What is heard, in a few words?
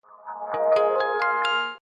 quit; Dargalon